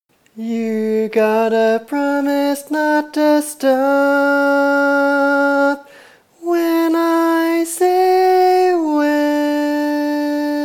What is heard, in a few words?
Tenor, barbershop tags